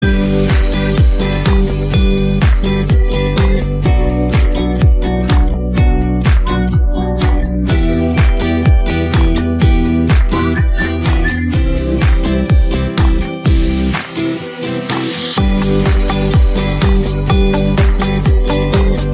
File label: Instrumental